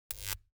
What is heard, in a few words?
zone electricity zap